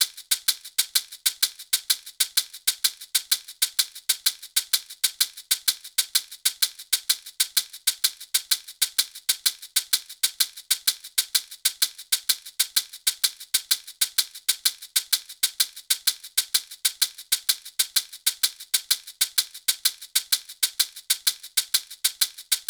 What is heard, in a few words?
Bembe 6 8 Shaker 128bpm; Freeze